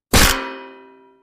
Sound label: hitting